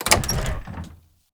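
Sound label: doors wood single light open; skyrim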